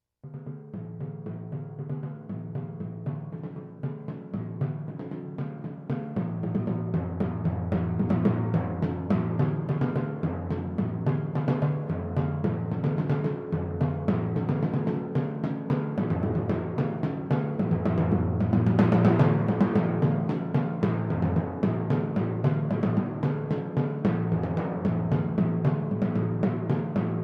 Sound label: timpani